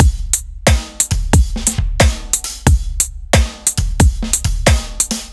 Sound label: Oldschool Drums, electric jazz; Ударные и барабаны: Old School Drums B (Электро Джаз); 130 BPM